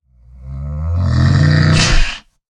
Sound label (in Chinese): 骆驼站起声